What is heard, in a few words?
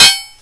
block, weapons